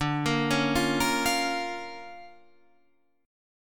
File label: D Minor Major 7th Sharp 5th, D, Guitar, Standard; 10,13,11,10,11,13; DmM7#5 arpeggiated; JGuitar